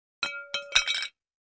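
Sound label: Large Tile Square Pieces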